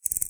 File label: Clicks rattle